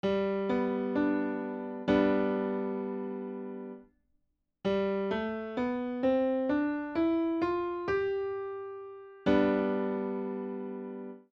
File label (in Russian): miksolidijskij zvuk; Звучание: более «архаичное» и народное. Используется в фольклоре, роке и блюзе.; Пример: соль–ля–си–до–ре–ми–фа–соль.